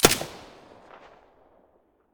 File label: mp5 silencer stereo, weapons